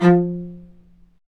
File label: Low Marcato; String Master Series; Combos 1